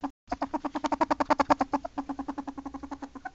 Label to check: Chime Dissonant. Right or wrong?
Wrong